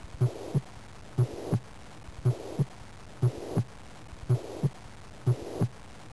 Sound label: Tricuspid Regurgitation